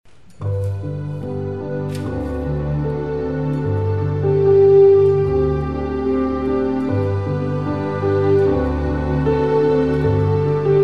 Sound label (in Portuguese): Piano e Cordas; Panis Angelicus